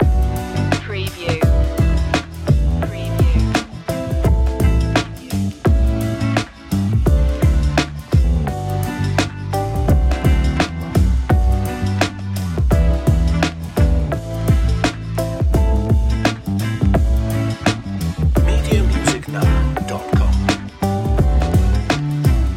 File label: chill jazzy vibe loop